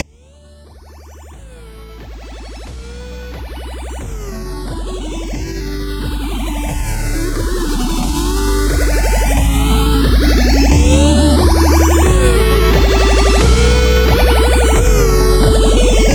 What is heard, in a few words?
8-Bit